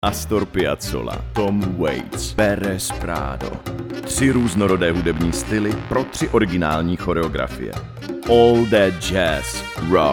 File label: rock blues